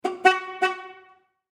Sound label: Auto Horn Honk City Three Times Automobile Car; Vehicle; Cars; Vehicles